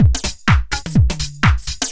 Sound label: loop 125bpm, House Drum Loops